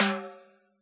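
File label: timbale high; AnlgKit1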